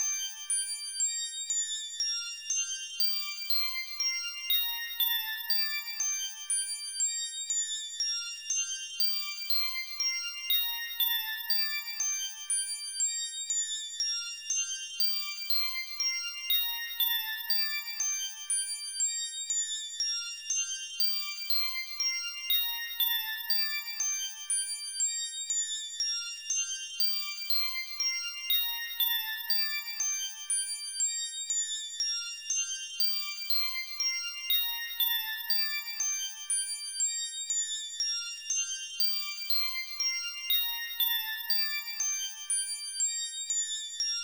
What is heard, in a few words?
BGM; unanswered prayer